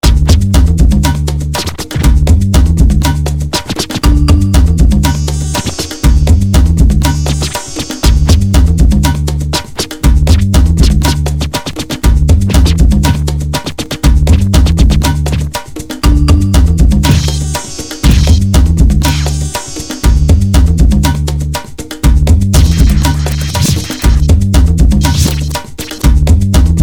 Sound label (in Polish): Walka Z Bossem; Indiana Jack